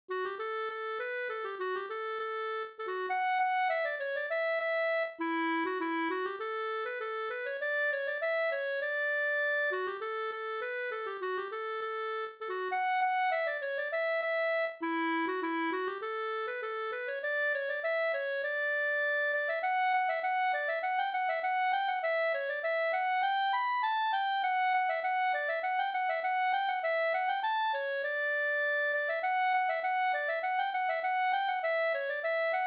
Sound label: polka